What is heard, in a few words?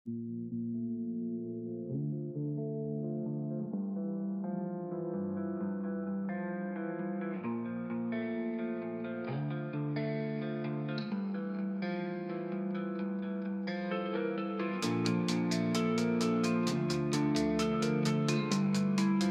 rock